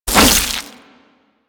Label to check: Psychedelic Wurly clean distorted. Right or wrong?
Wrong